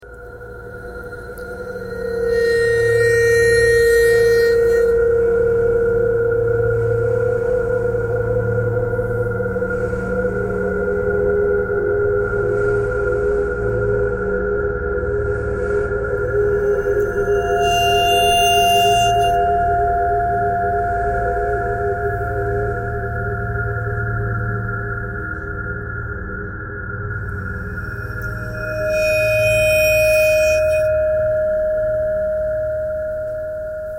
ice bowed vibes traffic k core birds thread pentatonic; bowed metal